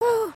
wave, emotes

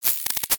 Spiderling Stunned Struggle; creatures mechanical

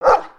dog bark

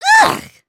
thumbs down; emotes

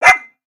Pet Dog Robotic Happy; creatures pets; Lego

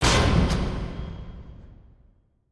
piston arrive; spaceship

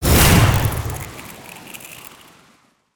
Ice Shuriken Throw; Spinjitsu

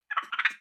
Pets Skunk Idle Short; creatures pets; Lego

